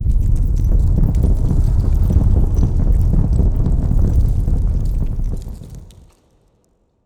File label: Statue Assemble; Player Instrument; Music Minigame